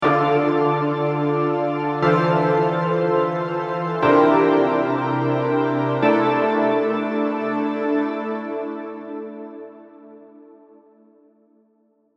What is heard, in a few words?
vital presets; chiqui audio; vital presets pack